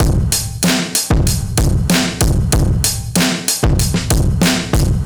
Slack Drums B, 95bpm, Drums n Perc, analogue circuit; musicradar